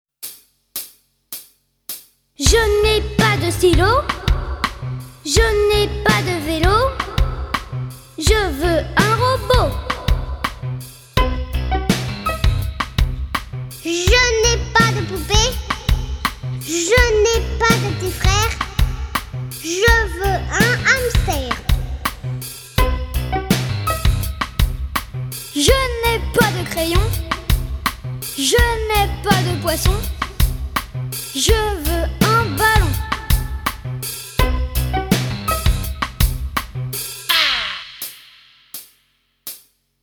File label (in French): RAP; Alex et Zoé 1